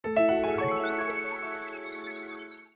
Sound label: Acoustic Piano; LG LV7500 Whistle; Message